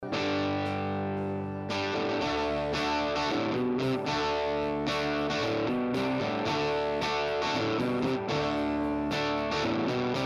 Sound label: Live Guitar Amp sample